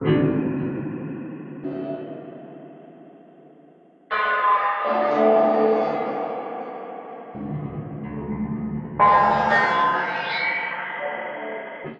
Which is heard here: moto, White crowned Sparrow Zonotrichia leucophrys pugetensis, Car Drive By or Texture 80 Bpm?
Texture 80 Bpm